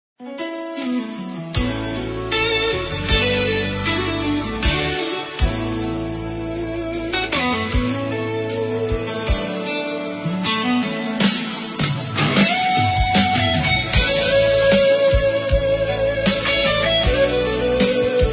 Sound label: Blues Rock